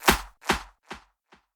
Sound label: Snare, PsyTrance